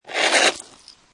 Snow And Ice Ice And Snow Grate With Ice Particles